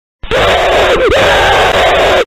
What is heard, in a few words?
reverse scream